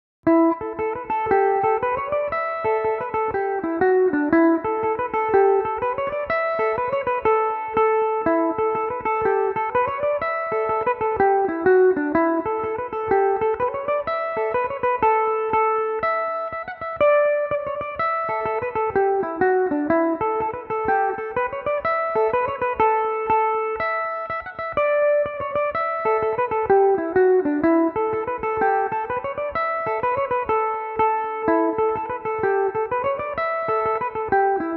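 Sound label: slide A mixolydian, Irish mandolin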